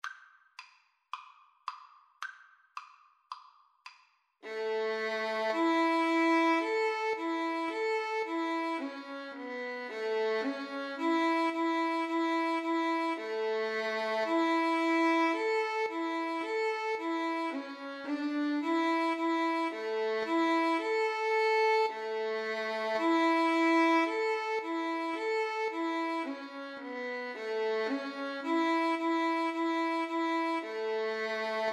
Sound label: trumpet voluntary violin